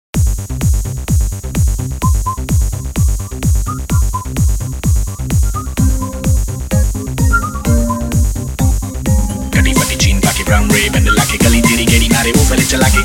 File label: (Remix)